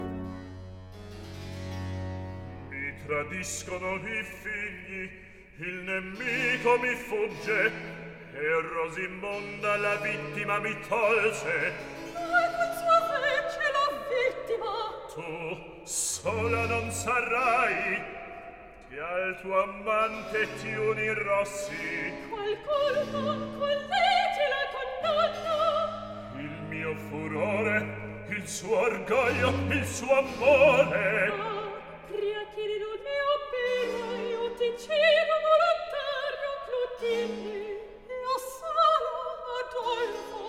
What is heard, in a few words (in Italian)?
Recitativo; Mi tradiscono; Handel Masterworks; Klassika; Act III